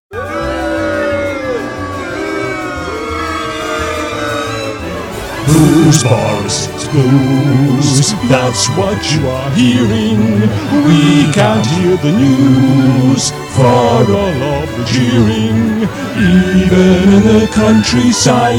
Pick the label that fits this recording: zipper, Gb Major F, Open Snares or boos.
boos